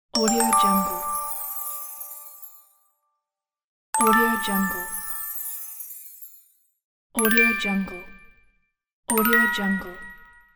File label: game bonus